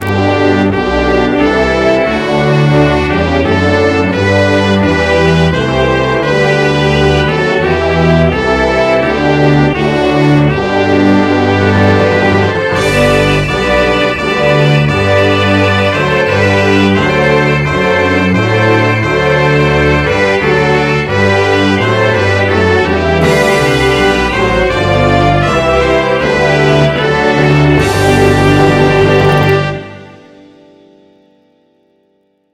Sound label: UK National Anthem, MIDI; God Save the King